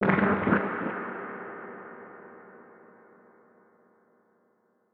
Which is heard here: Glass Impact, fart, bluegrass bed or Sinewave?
fart